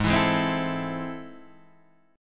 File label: A Minor Major 7th, G sharp